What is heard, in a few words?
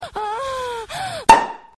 Girl Pop